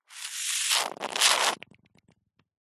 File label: foley liquid suck foamy slurp